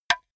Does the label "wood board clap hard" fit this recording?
Yes